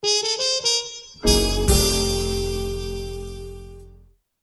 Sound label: game over